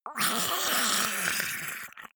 monster choking zombie idle high; koerpergeraeusche; comedy-cartoon; soundpool

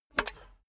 cash box metal lid open hard; maschinen; soundpool

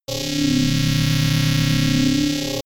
constant beam, laser; krieg-waffen; soundpool

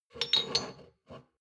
shot glasses pull out slide soft; berufe; gastronomie; soundpool

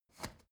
step ladder wood scrape single; schritte

